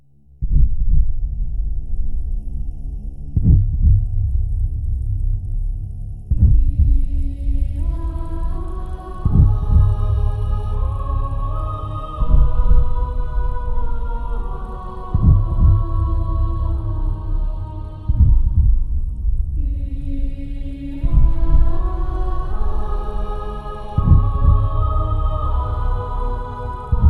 evil

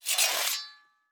Blacksmith

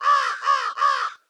Crow Scared, Bird Flock Crow; Bird Flocks

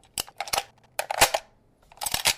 Rifle Reload; Invector-3rdPersonController; Shooter; Weapons

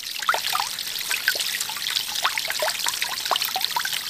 Loop Water; KriptoFX; Realistic Effects Pack v1; PARTICLES

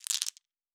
Objects Small, Fantasy Interface Sounds

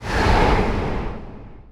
In Wave; KriptoFX; Realistic Effects Pack v1

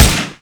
Rifle Fire; Invector-3rdPersonController; Shooter; Weapons

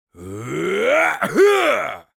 fat male strong attack; Enemys

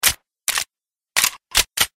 Handgun Reload; Invector-3rdPersonController; Shooter; Weapons

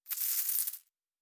Coins, Fantasy Interface Sounds

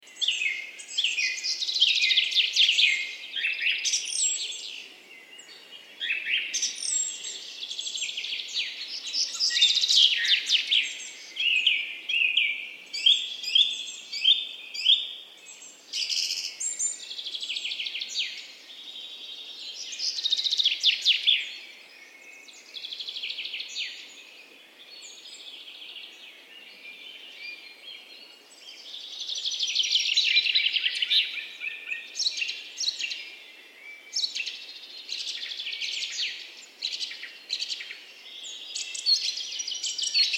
Birds chirping in the forest; Standard Assets; First Person Character

